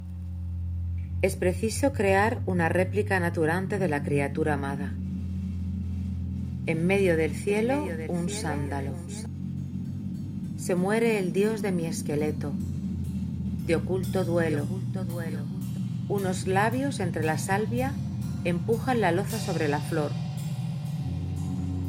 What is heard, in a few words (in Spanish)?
musica y voz